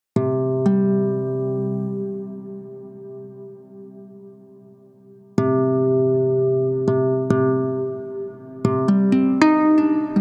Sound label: harp